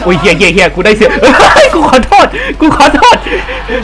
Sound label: lose